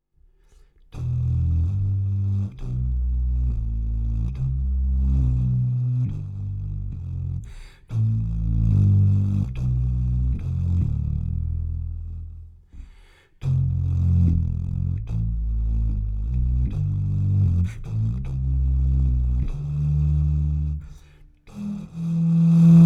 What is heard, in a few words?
small melody